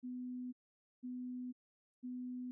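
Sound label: puretone 250 Hz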